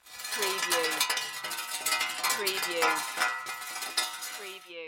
Metal Rattle Grind Medium

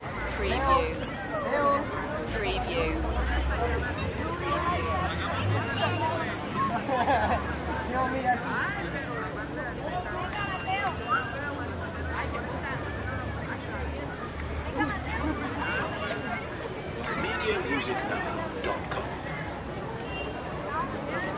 city square ambience

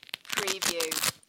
Velcro Pull Apart

